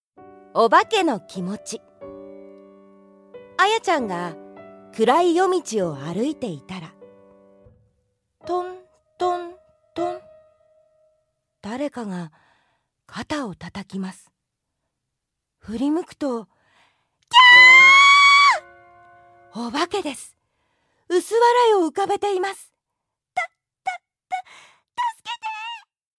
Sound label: BGM